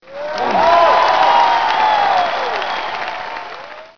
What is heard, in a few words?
game end